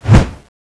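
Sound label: crowbar miss; svencoop; gruntscourse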